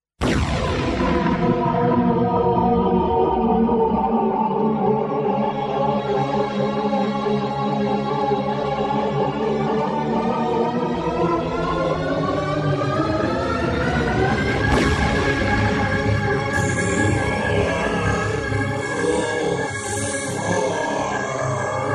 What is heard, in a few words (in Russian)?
polet babi yagi